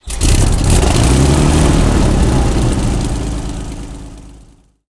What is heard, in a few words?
comet engine start, tanks; War Thunder